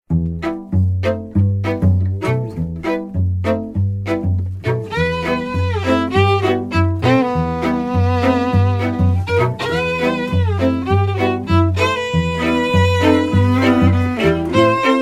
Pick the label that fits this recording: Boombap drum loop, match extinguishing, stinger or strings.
strings